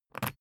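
briefcase plastic latch lock key pull out quick, Büroarbeit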